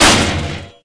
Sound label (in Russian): metkij massirovannij udar po metallicheskoj celi